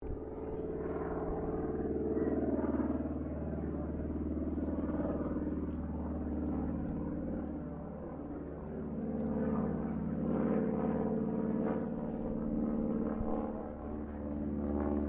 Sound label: Aircraft CAC CA-25 Winjeel manoeuvering, WW2 era Australian training aircraft; Airplanes